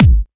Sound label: Bassdrums Clubhouse; Kicks; Vengeance Samples